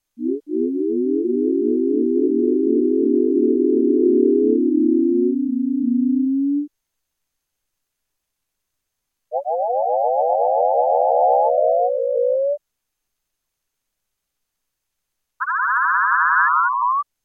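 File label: C major chords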